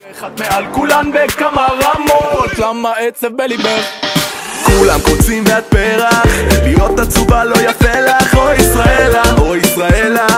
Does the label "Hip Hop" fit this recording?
Yes